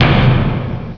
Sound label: orch bass drum C2; Star Trek JR Audio